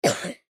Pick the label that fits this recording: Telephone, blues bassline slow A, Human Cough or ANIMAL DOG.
Human Cough